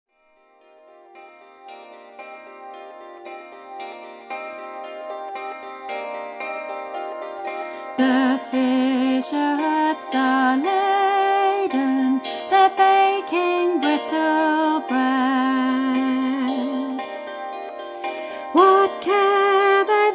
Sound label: Stromming Boat Sample